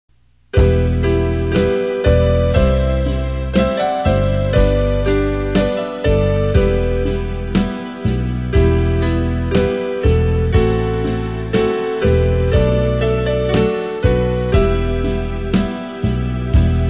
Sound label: bass